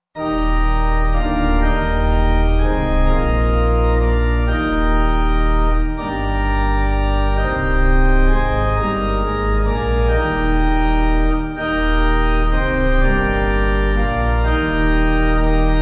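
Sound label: Pipe, hymn backing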